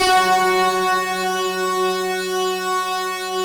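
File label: fast attack fuzz; Optical Media International; Sonic Images Library; Fast fuzz Res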